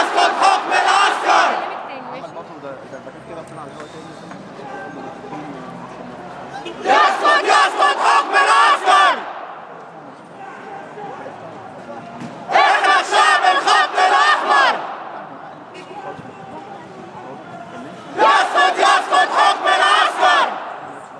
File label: chants down down with military rule the echo is deafening